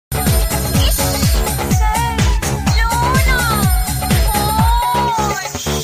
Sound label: folk dance